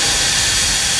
cannon dragon ice, weapons

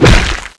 axe slash hit, weapons

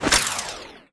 guillotine draw, weapons

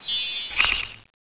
magic knife slash end, weapons

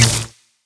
ignite mg shoot loop end, weapons

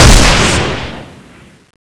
mgsm, weapons

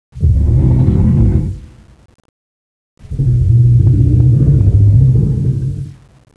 groggy loop, kraken; CSO KrakenBoss

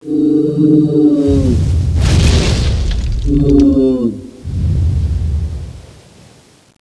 death, tentaculo; CSO KrakenBoss

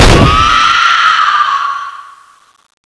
attack special; CSO Cronowing; cronobotics54

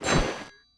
axe draw, weapons

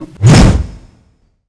hammer slash

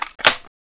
M16A1 clip in

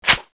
clip in, weapons

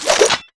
whip sword stab end

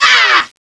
pain, zombie; zm mod

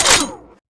laser shoot end, weapons

